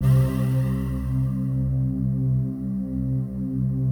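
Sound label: PAD, atmospheric pads; Best Service; XX Large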